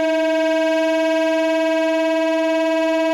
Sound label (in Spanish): Tremolos